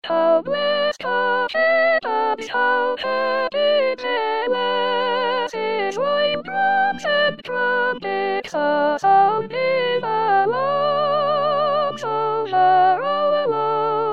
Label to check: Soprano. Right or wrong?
Right